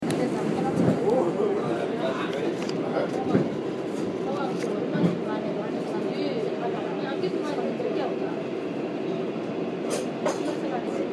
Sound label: Subway; Subway on January 30th around 2:00pm